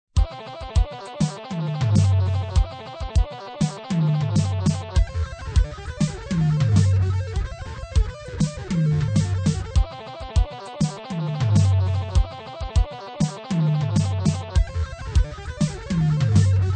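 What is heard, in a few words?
32nd notes, straight 4/4 time, 100 bpm, guitar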